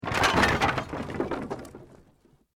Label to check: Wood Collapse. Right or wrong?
Right